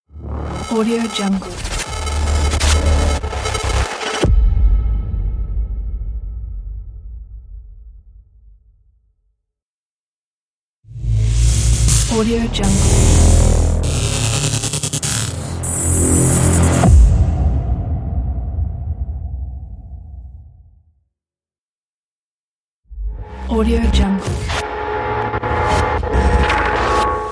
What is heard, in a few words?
glitch logo; logo pack; wise-band